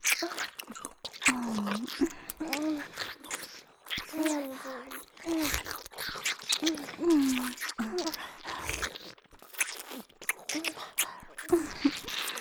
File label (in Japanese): ループ 両耳舐め＆ダブルフェラ; 人外姉妹のダブルフェラ～囁き声と耳舐めで脳みそトロトロになるまで嫐り犯してあげる～; 妹すたー